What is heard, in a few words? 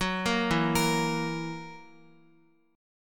E Suspended 2nd Flat 5th E down Guitar, Esus2b5 chord; arpeggiated; JGuitar